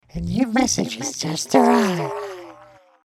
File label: crazy email alert sound